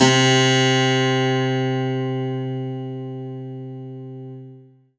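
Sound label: Alesis Sanctuary QCard Harpsichord C3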